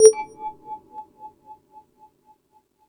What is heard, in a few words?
Multi FX, Drum Machines, E-MU Pro-Cussion